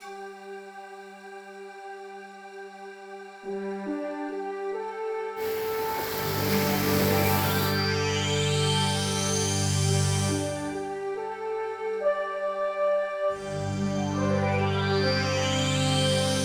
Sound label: midi; star trek deep space nine